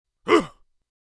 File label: Orc creature surprised